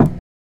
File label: PM-200 Wurlitzer A#0; Keyboards; Ableton Foundation FreePacks